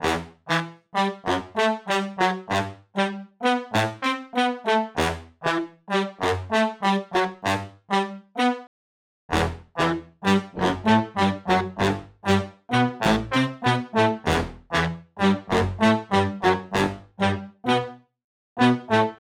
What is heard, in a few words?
Horn Section Dry